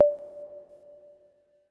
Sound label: Sine Pluck D1; blek; android; wii u